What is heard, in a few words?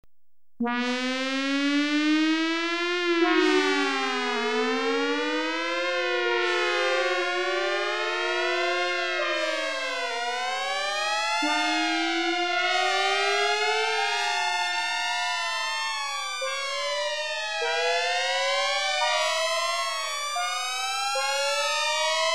4 Minute Warning Alert, Electronically Generated Sounds; BBC Sound Effects Library